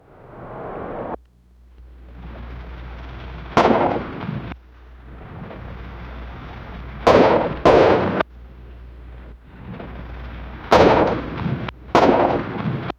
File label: wartime ambient backgroun, artillery